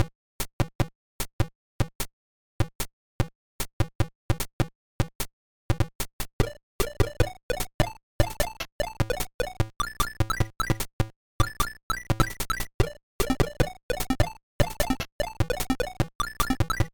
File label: psg techno test; weeklybeats 2024